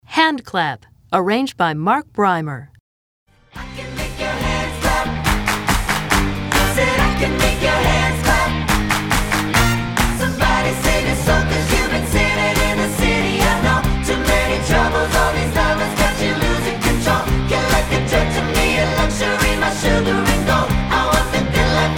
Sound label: Handclap